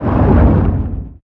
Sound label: creature shadowleviathan seatruck attack loop water